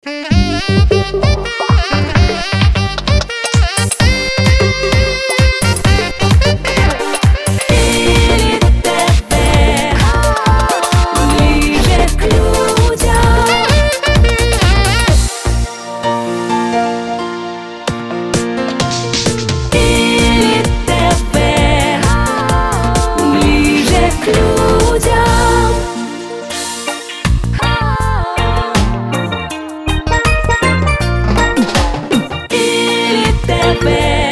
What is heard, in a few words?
Jingles; efir